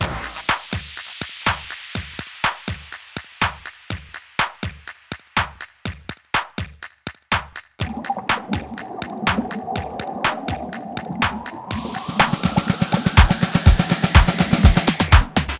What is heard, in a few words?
DRUM